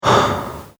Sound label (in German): vox atem